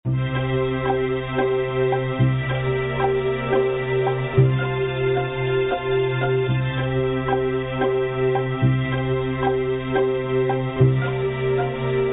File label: Classical, On Hold Messages; Professionally recorded phone messages; movox